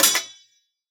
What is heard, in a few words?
Sword Hit